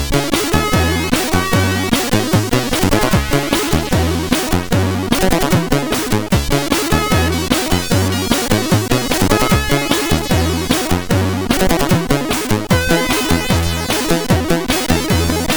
Menutune, SID CSG8580R5; new player test

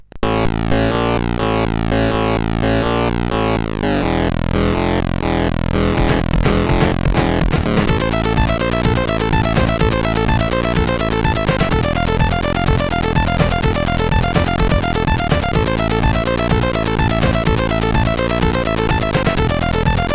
Nice Drum, sid CSG8580R5

